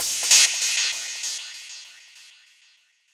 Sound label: Gated Cymbal; musicradar